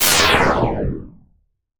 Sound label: Alien Wierdness Descending, Sci Fi; 1001 Sound Effects